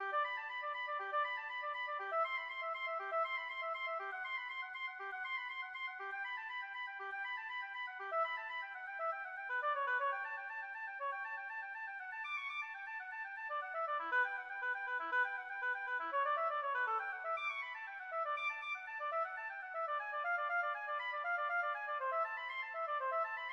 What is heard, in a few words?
bach cello suite 1, oboe